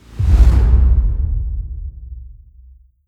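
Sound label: shield fire layer; apocalypse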